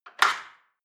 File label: dishwasher cutlery grab